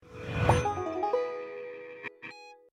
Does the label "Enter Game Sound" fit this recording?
Yes